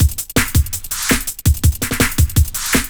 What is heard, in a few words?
Gravitational Break; Jungle; Breaks; Blu Mar Ten - Jungle Jungle - 1989 to 1999 Samplepack